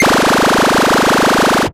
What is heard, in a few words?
DEATH RAY